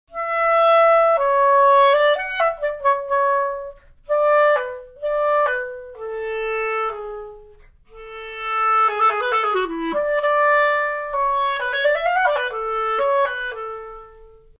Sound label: Plays Mozart